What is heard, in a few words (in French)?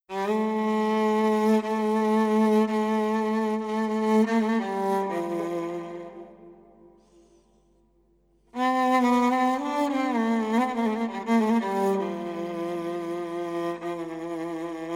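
Taqsim Au Violon, Arabo-andalusian Sufi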